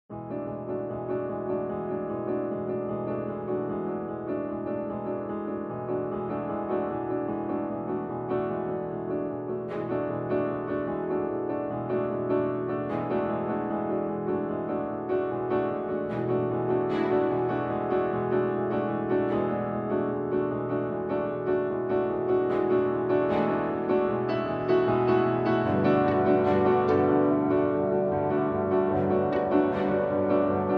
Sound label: orchestral